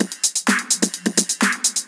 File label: land of boom, Drum loops